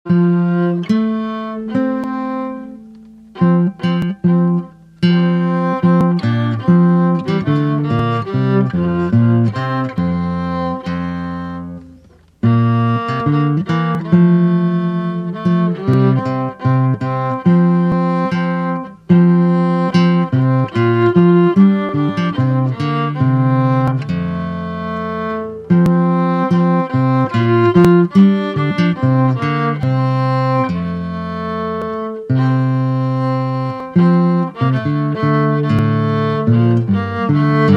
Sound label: A bow B guitar; Quando ritrovo